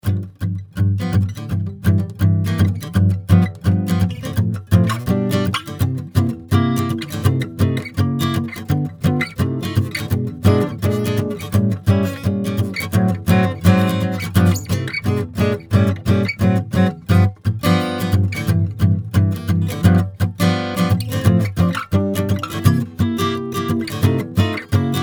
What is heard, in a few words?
Jazz; Schoeps CMMT; SANTA CRUZ OM/PW AC. GUITAR; 600 preamp; Apogee Rosetta 200 A/D converter